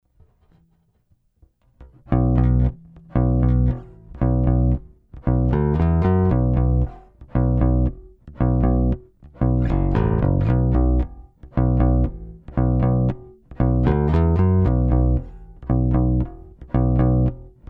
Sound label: pick